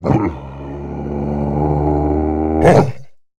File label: Canine Long Roar With Bark, monster sounds; The Decline Of Warriors; Monster Sounds Volume II